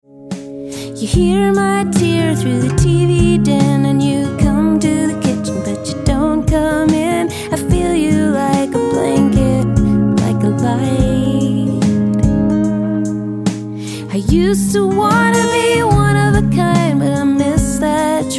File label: Rise